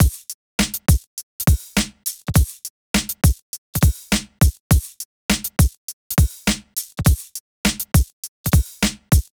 Drums, 102 Fmin

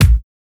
Kick Hit; Hip Hop; 080 Cmin

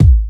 Kick Hit; Hip Hop Exotica; Apple Loops; Big Fish Audio

